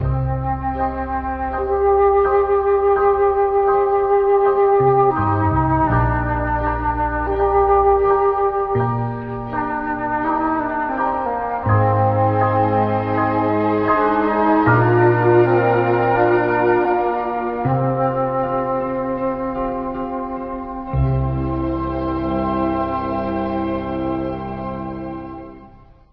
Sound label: flute and piano and strings, Sad